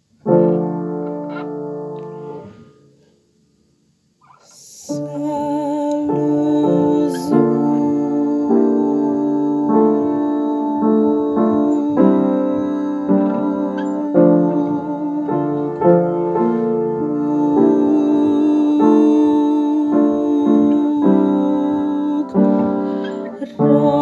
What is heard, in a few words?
Bass; Tabortuznel